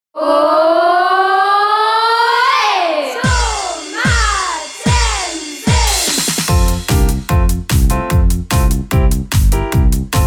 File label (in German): Tomatenlied Karaoke